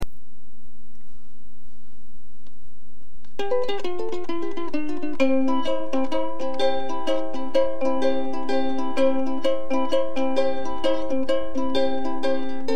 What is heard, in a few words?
ukulele impro